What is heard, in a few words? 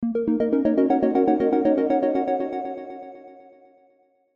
Synth Chord, Synth Chord Arpeggio Smooth; Musical; Synths; Blastwave